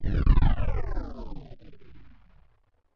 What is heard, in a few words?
SPACESHIP FLYBY